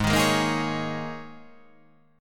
G sharp Suspended 2nd Suspended 4th G sharp, guitar